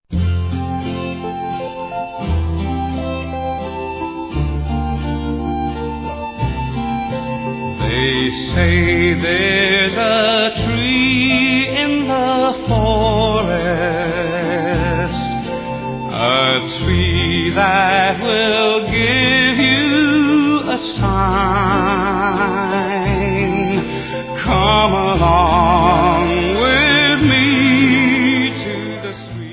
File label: Waltz, 29 bpm